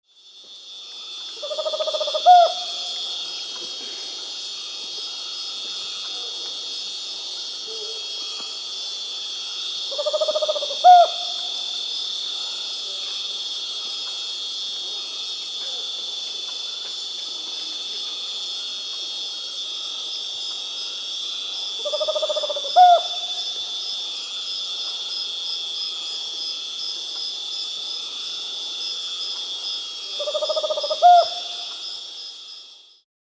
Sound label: Tropical Screech-Owl (Otus choliba), Peru; S. America